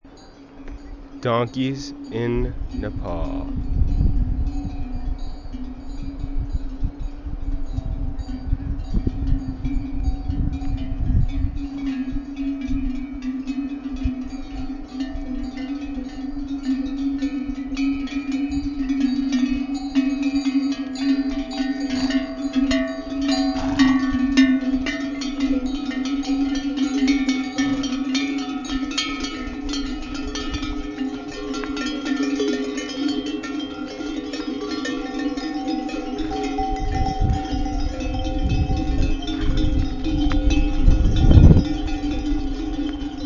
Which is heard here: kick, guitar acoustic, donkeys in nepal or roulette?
donkeys in nepal